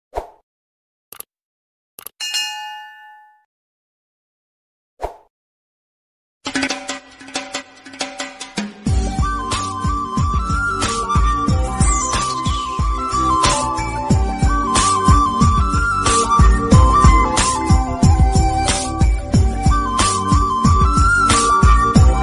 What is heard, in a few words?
love bgm ringtone south indian bgm ringtone tamil ringtone; famous tamil bgm tone